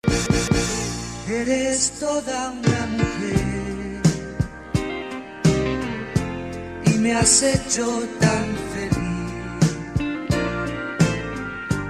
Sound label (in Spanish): ringtone